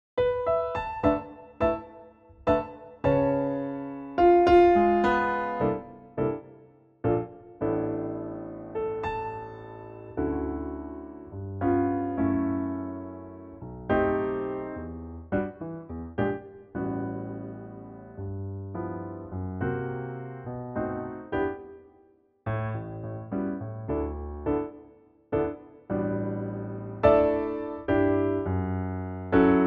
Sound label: D piano